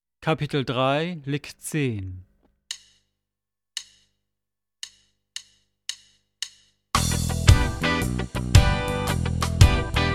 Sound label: Latin Style Guitar